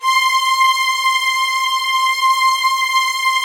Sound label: LDFV C6, LOUD VIOLINS; Q Up Arts; Denny Jaeger Master Violin Library